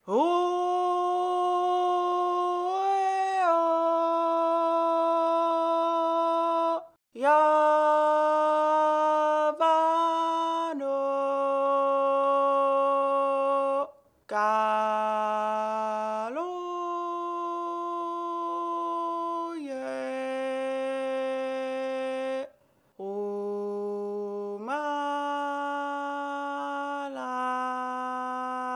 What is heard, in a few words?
sing; Hollow Knight: Silksong